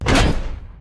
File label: close Modal; qs-advancedgarages